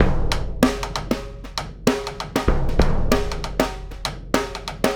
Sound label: Big Drum and Sidestick; Sonic Foundry (Sony Creative Software); Essential Percussion; Drums; Big Drum Combos